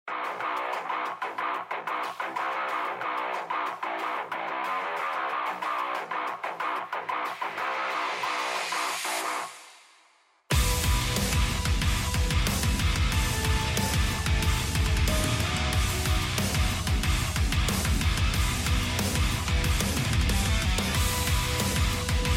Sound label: Battle Forest